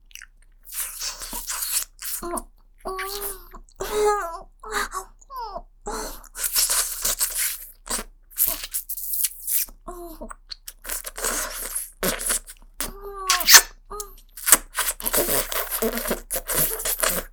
喘ぎながら＋すすり上げ; 舐め屋; ゆるふわ羊のパティシエさん; 首輪ちゃんのわけありや

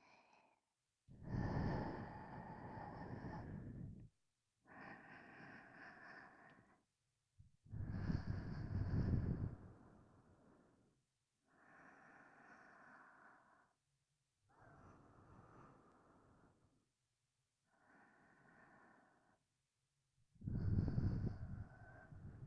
寝息（右耳）; ループ用音声; 純真無垢なティナの癒やしの魔法; バイノーラル音声作品

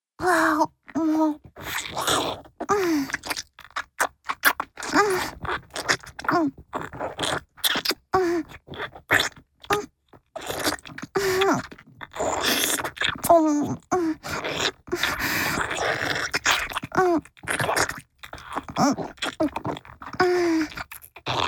チュパ音, 高音抑制; パイズリフェラ(中):陰茎を胸でしごきながら亀頭をしゃぶる

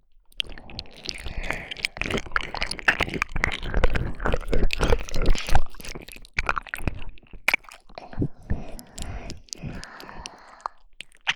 耳舐めのみ(両耳）

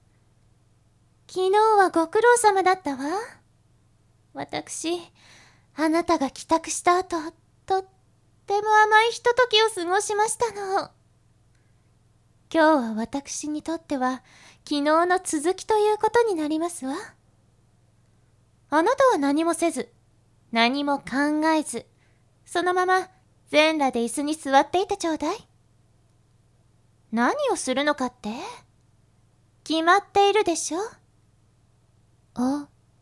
耳舐め 効果音なし; 勤務初日

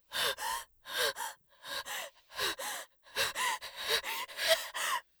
過呼吸; 効果音あり

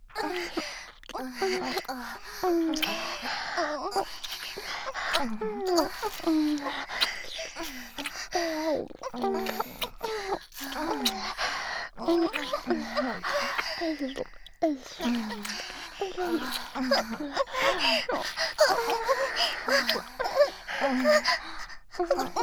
キス ＆ 喘ぎ ループ

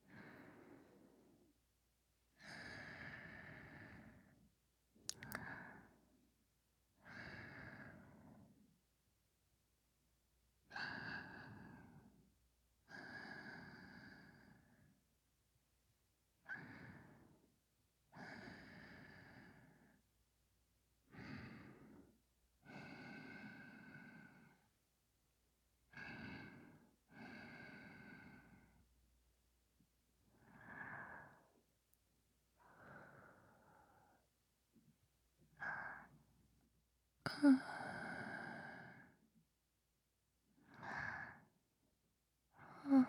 寝息トラック 左右反転

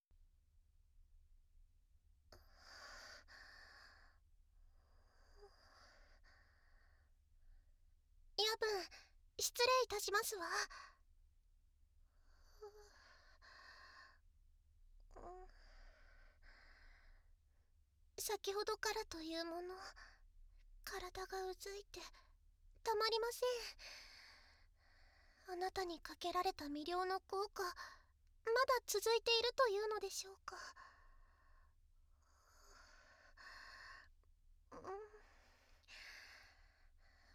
ディープキスと お姫様の 強烈フェラチオ（効果音なし）

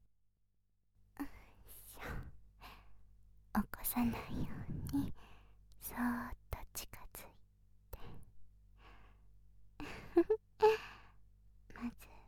亜凛お姉ちゃんの耳舐め添い寝

